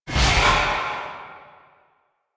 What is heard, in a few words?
Void Dragon Baby Mine Boost; Enemies; Warframe; Duviri